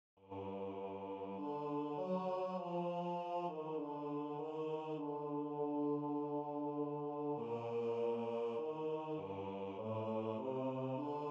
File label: choir